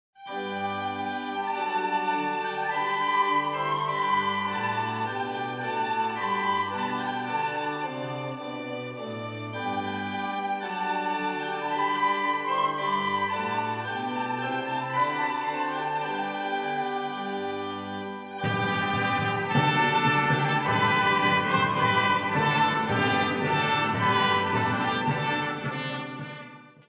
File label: Trumpet voluntary